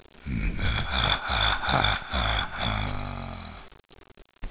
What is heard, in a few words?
Evil Laughter; Mwhahaahah!